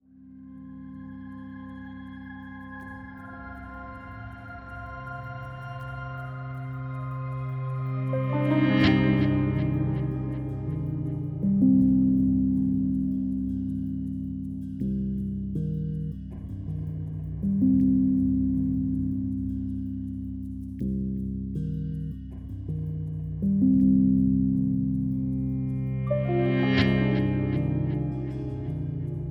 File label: Sting; Dead to Rights: Retribution; Mu Level 05 Jack Sting 02